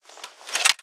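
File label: weapon police baton equip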